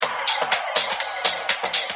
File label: drum loop